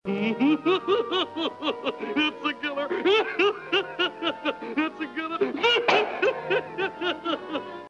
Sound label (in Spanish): risa hipo; its a killer